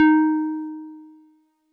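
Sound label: Wood Piano DX100 D#4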